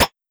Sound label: knife fast hit; kakamora game; mixkit